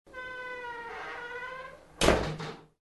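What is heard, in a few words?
door sounds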